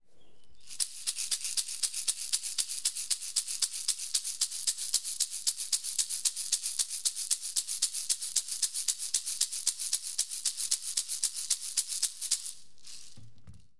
Natural reverb, sonaja

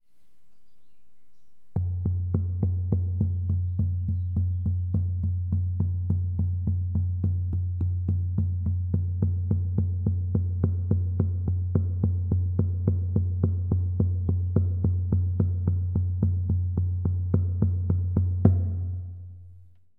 reverb, tambor

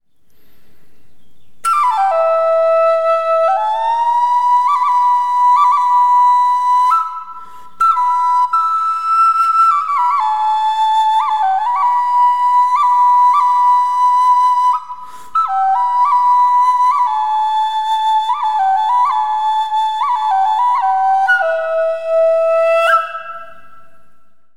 basica reverb

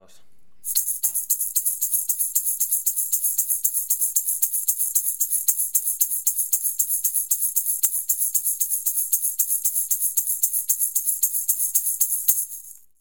shaker seco, sonaja

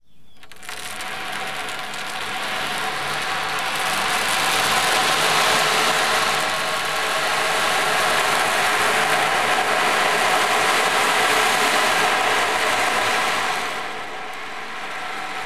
Oceanico tejido piel seco

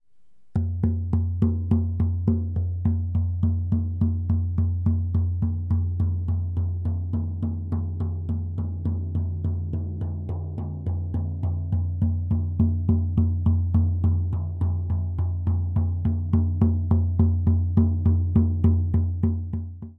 Tambor baño sonido seco

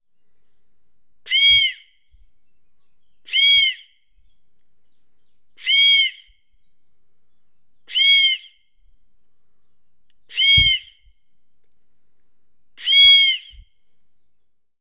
Silbato aguila seco

